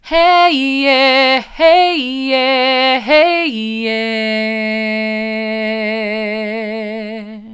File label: hey mid, vocal